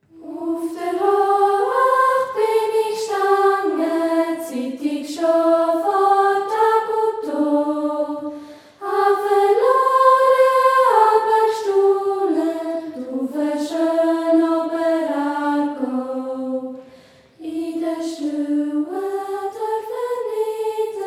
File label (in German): Sopran